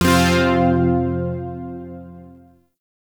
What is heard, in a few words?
Shawzin Chromatic Chord I; Warframe; Zariman Shawzin; Chords